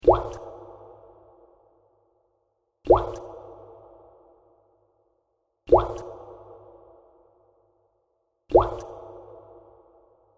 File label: homebeat